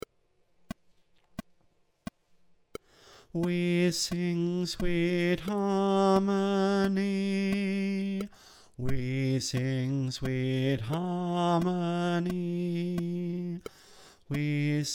bas part 1

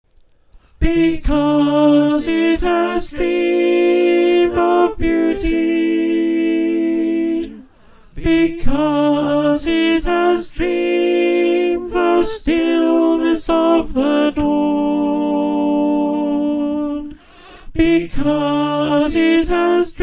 up a tone in F Alt, choir

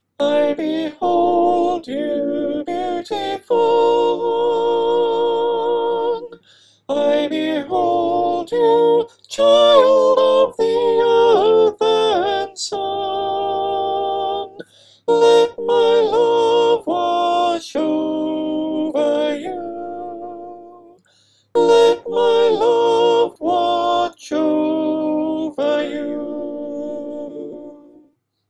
Sop, choir

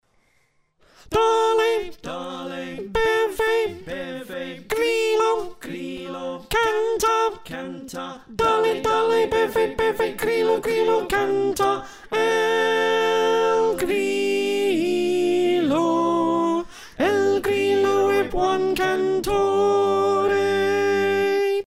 Slow Soprano

